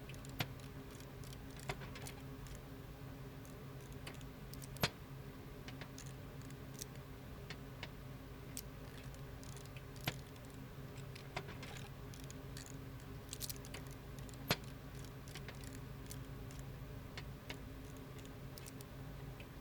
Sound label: indoor workshop; anemoi